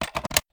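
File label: sniper reload extended mag mag in; gmod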